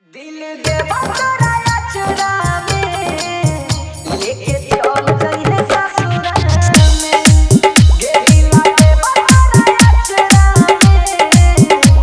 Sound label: Sad Bhojpuri Hard Dhollki Bass Mix